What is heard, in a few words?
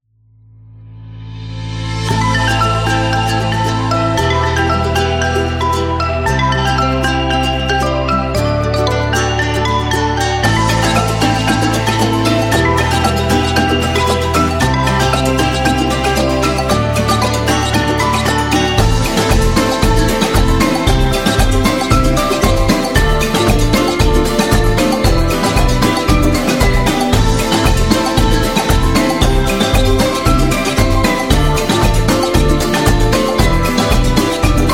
Asian Beat